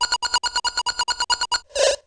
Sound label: Arcade Beeps